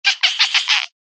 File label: evil laughter real; Halloween